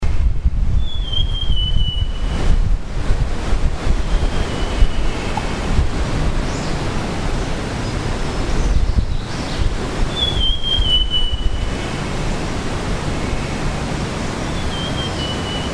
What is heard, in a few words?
sibia beautiful Heterophasia pulchella